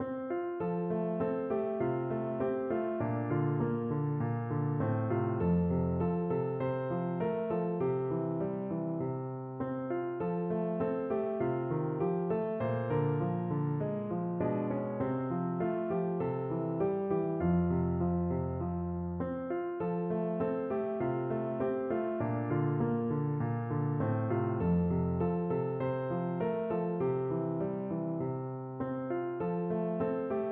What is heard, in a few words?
red river valley, piano